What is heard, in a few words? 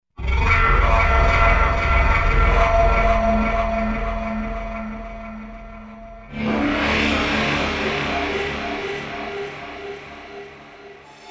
Horror Film